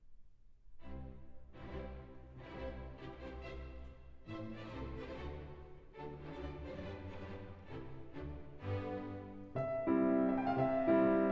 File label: Piano Concerto No 4 in G Major, Rondo (Vivace); Op 58; Beethoven